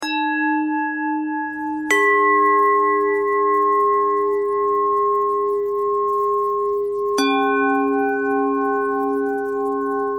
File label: bell threads